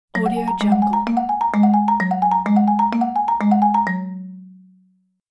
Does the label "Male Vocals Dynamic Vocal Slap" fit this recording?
No